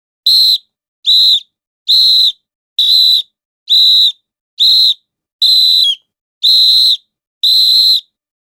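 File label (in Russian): футбольный матч судья свистит быстро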